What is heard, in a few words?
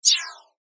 Whoosh Bright, Whoosh, Fast, Filter, Ascend; Whooshes; Multimedia; Production Element, Imaging Element, Accent, Transition; Blastwave